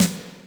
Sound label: snaredrum, Yamaha RM 50